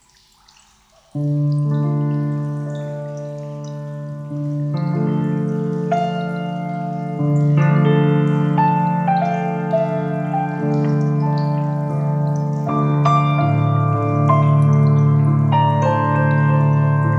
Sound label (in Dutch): piano improvisatie